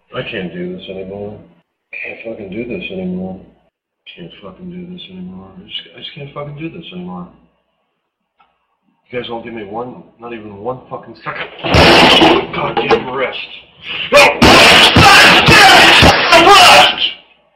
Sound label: classic rage desk pounding